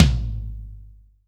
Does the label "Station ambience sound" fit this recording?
No